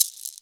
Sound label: SHAKER, PERC; AKAI S-Series CD-ROM Sound Library VOL-2